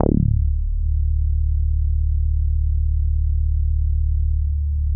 Synths BASS

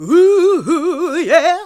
GOSP. Singin; Sound & Vision - Gigapack II CD 1 (Roland); HAL Gosp.Singin`

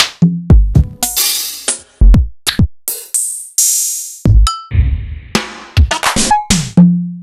EMU Proteus-2000; Sliced

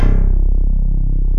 LINEAR SYNTH

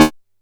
D#3, Bass; E-MU Producer Series Vol. 6 – Analogia Project; Boom da Bass

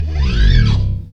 BS CHPSTK, Chapman Stick

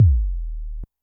korg trident

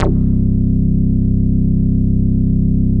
SYN BS3; Greytsounds - Scott Peers Sound Engineering

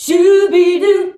SCHUBIDU G, FEMALES, Hyper Dance

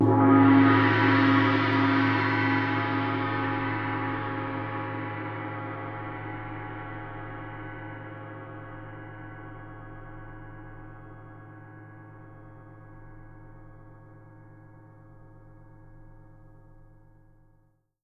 GONG, Percussion; Miroslav Vitous - Percussion & Harp

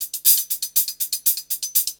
120 HIHAT

